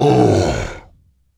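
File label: dragon hurt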